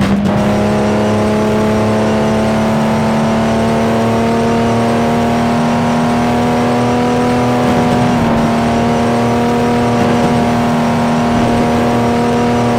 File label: fourth cruise, vehicles